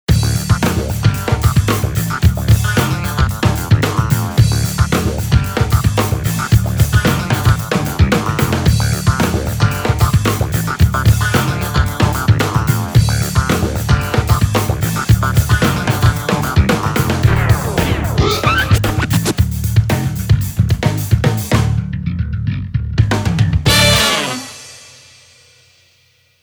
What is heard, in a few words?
Pretty Dancer, RINGTONE; MIUI12